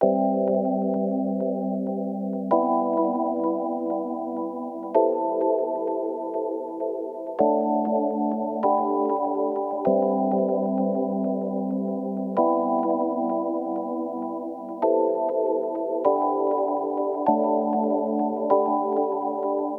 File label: Chord, Tape Keyboard, 97bpm, C, Analog Vintage